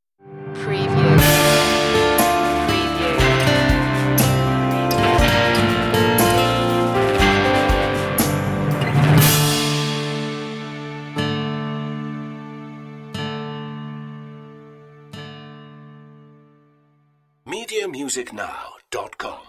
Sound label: stinger